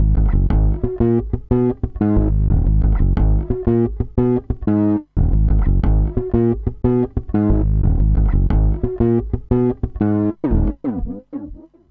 Hustle Flange, Bass; Zero-G Smoke

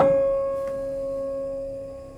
Prepared Piano; Battery 3 Sample Kits

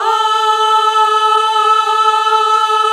GOSP. AAAHH; Sound & Vision - Gigapack II CD 1 (Roland); HAL Gosp. Aaah 1

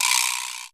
VIBRA, PERCUSSION; Crypton - DrumBase5000; PERCUSSION#1

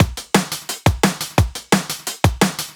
DNB BREAK

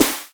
SD HOU, Dance Mega Drums; Best Service

